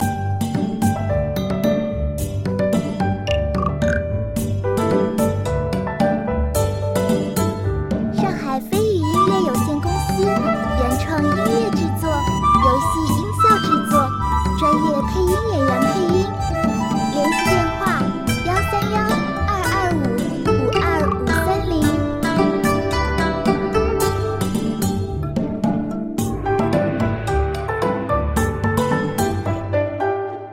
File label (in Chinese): 欢快-民乐-中国风